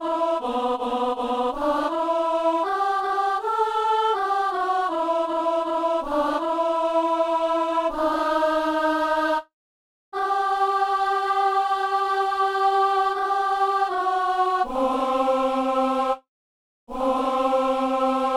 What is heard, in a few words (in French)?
psaume alto; Psaume 97 (98)